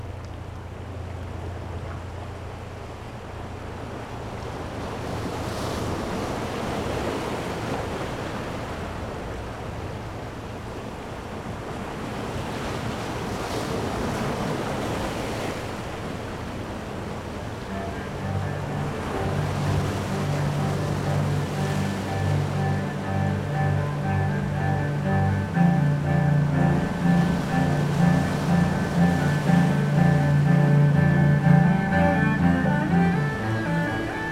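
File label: surf, nature sounds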